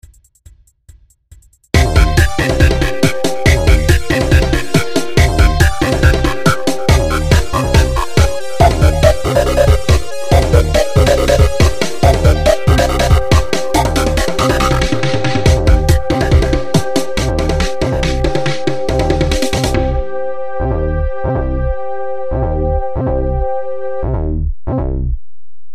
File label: Instrumental